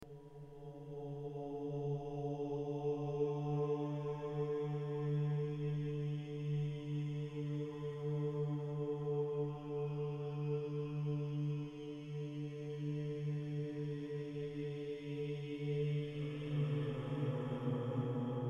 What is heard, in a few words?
occult chant ambience; Benin Ancestral Chant; Benin’s ancestral chants, deeply embedded in its culture and spirituality, are a powerful; The Nso Royal Palace